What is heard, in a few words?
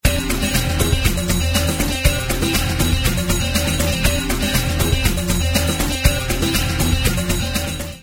Hear Them All At Once That's right, they can all fit together (they're at the same tempo); rhythm